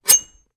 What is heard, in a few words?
Knife Draws, knives